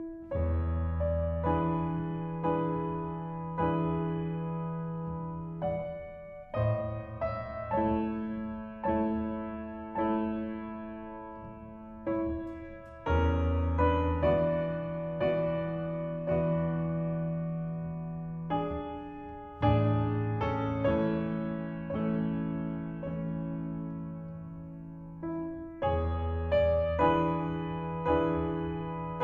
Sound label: Prelude 7 A Major, piano; Chopin